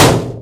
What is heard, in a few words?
metal sheet impact hard; physics; Garry's Mod; hl2